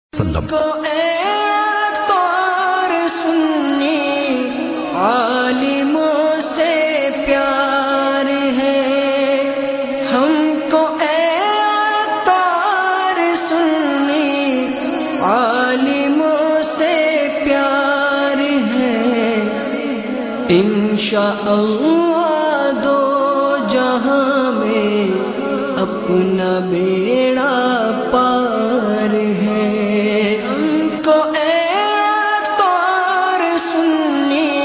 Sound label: mobile phone ring tone